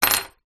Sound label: sport whistle metal place down on wooden table